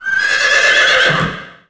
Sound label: statue; norleans